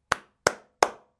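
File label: hand clap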